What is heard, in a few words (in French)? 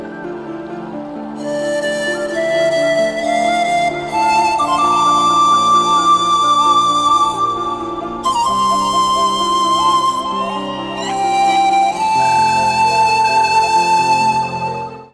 Indien flute paon